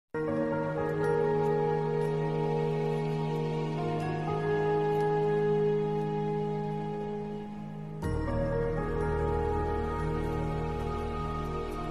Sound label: love ringtone; Abraham Ozler movie